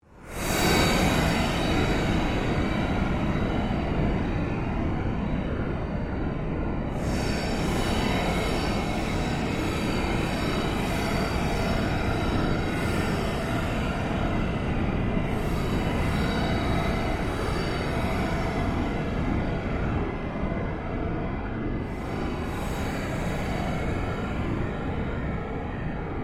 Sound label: Created Surge Fade Slow, Rise And Dive Sound Effects; Various sweeps, pulses and drones; Rises and Dives